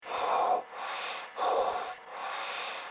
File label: breath medium; Serious Sam The First Encounter; Forager